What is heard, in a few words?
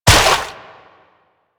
step water; Enemies; Warframe; Infested; ZealoidPrelate